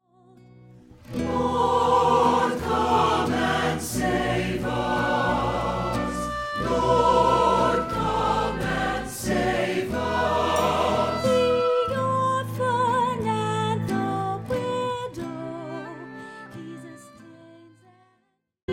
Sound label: lord come and save us psalm 146